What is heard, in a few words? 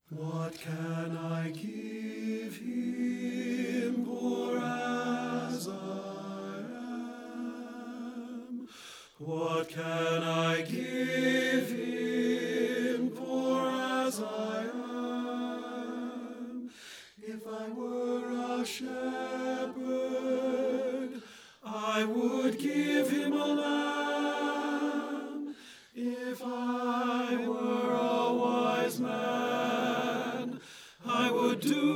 tenor; satb